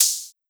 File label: Shaker Drop; Drums